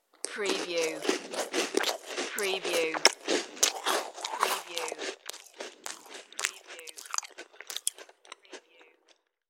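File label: Chewing Cracker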